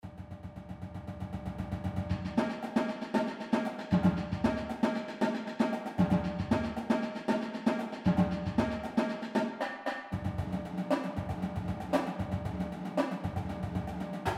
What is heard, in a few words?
cadence, marching